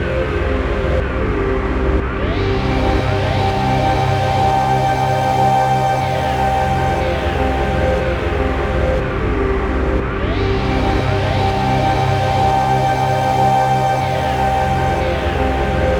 Synth Chords; Eclectic Electronica

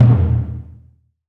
MED DRUM, JAPANESE

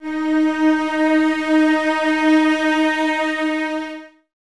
D#4, Cellos, String Ensembles; Miroslav Vitous

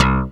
DX SYN BASS

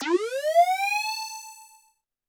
percussion, Roland TD-20

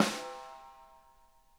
SNARE EDG; Sonic Reality; Interactive Drum Kits

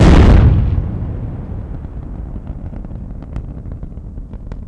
EXPLO

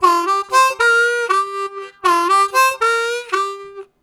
Harmonica, Riffs; Zero G - Harmonica

